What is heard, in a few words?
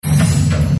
intro sfx; California Gold Rush